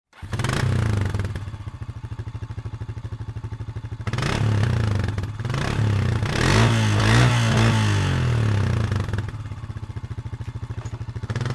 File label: motorcycle start leave